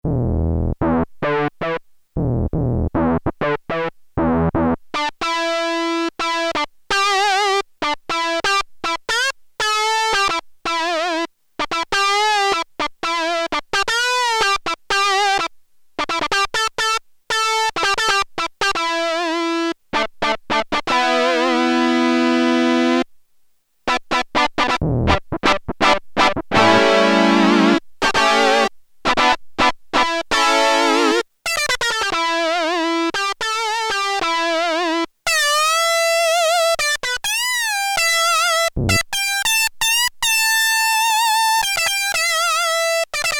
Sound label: Sync, Sequential Circuits Prophet-5; Sequential Circuits Prophet-5 (Ver.3); Factory Patch